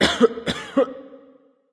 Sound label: clearthroat; erlangshen